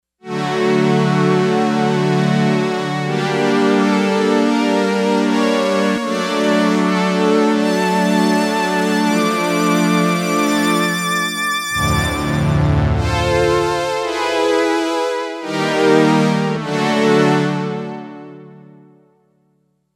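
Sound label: Synth Strings 1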